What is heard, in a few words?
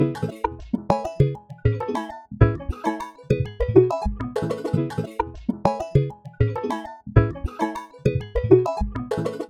synth Loop plucky E maj 200 bpm; base pack